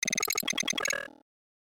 Videogame sounds

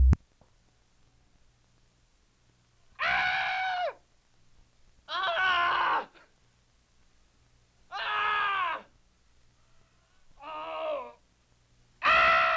Man Screams

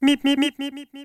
Bip

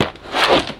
Pick Up Briefcase

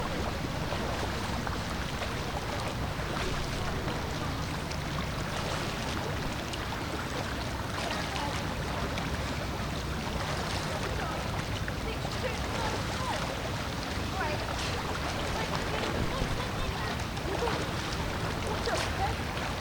Outdoor Pool Atmosphere

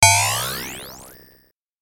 Confirmation Ascending

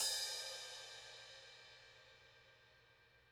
Crash A Custom 18 Tip

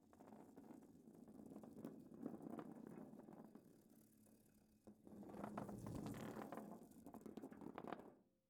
Marble Rolling

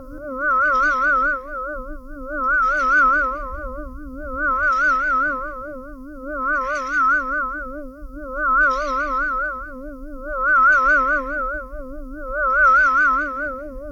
Ufo Patrol

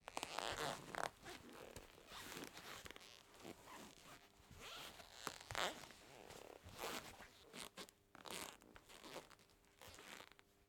Leather Creak

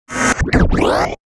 Mech Step

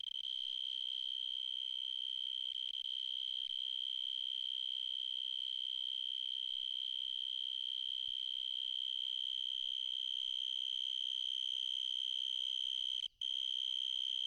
Lonely Cricket

